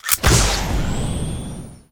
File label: Bazooka Attack